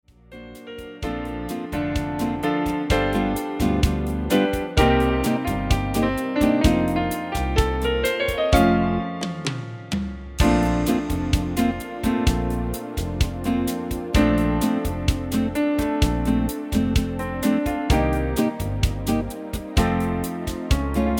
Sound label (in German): Stille Nacht Bossa Bb